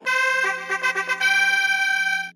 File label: fanfare